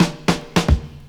DRMROLL; E-Lab; X Static Goldmine 2

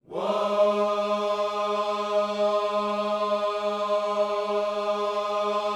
WHOA G#3, gospel; Spectrasonics; Vocal Planet Vol.1 Gospel; akai

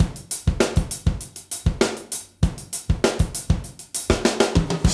D3 BREAK

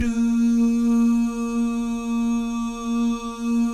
"doo" guys, Vocal Jazz Fx

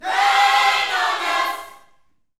YOUTH CH PHRASE, Vocal Planet Gospel

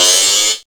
SYNTH

